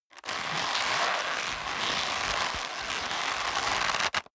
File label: leather creak long hard